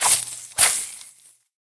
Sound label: swim; Level6 Scrooge